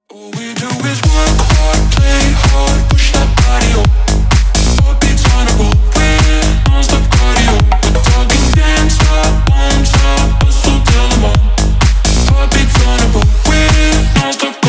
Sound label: trumpet